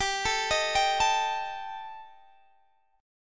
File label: G Suspended 2nd Sharp 5th, Guitar DADGAD; Gsus2#5; arpeggiated; x,22,19,20,22,19